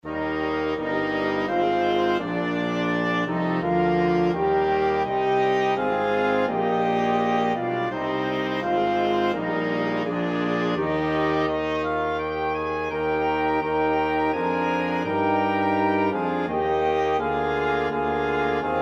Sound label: flexible mixed ensemble, 4 players